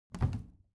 object plastic trash can open regular

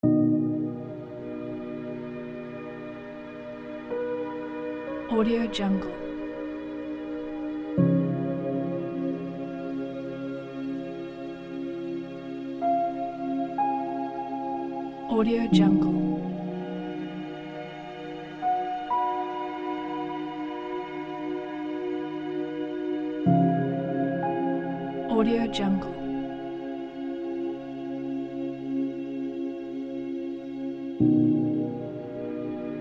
frozen strings dramatic piano ambience; interstellar-studios

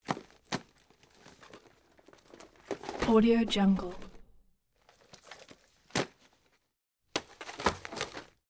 purse large shoulder bag; prosoundfx

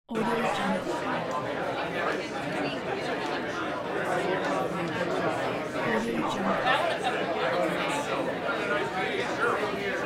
bar or restaurant ambience loop; lake3sound

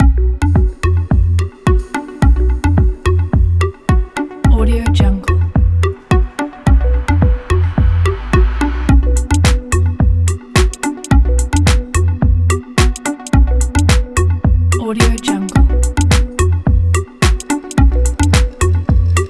house

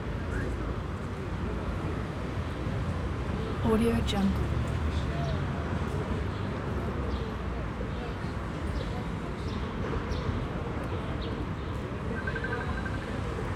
city square ambience, Athens

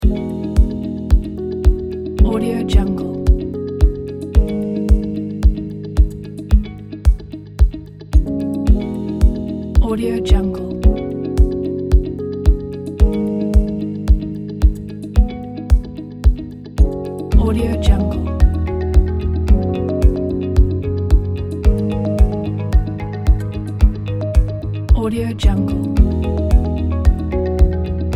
slow inspiration

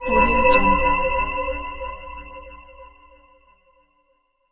scifi ambience; wildemotion

